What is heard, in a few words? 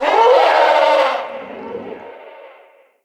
Ext Dist Animal; Midrath Island